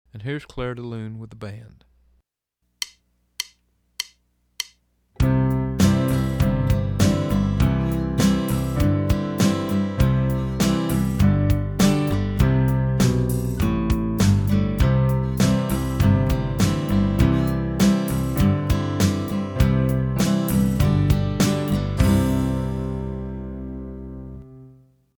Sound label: Bass Clef Fast